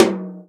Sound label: tom, Real Drum Kit, jungle kit